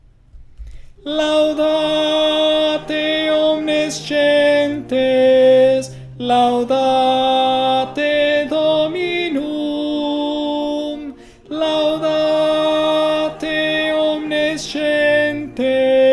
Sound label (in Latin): contralto; Laudate omnes gentes